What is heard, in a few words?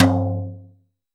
Boss DR880 tom; drums